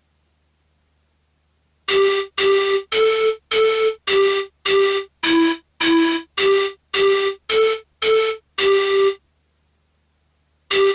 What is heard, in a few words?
Acka Backa Melody